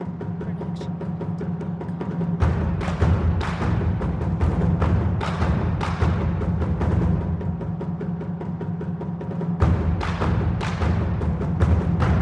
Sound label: japanese taikazo; soundscrate